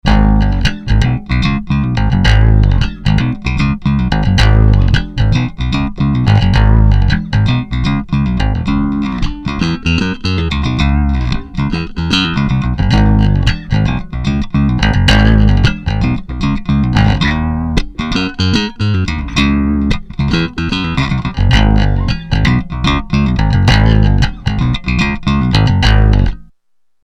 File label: riff GT10B default SVT no cab